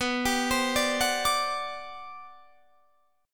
G Major 11th B

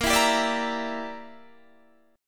A sharp 6th Flat 5th, Guitar Standard; A#6b5 strummed; JGuitar

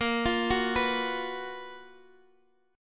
F sharp Major 11th A sharp

